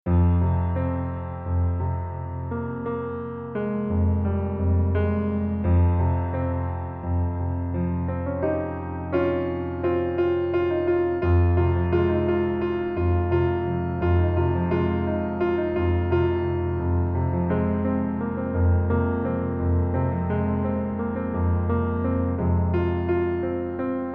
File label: Piano